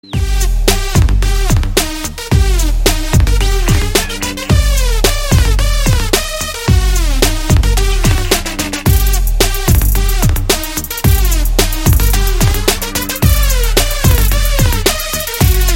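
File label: Trap Remix; Star Wars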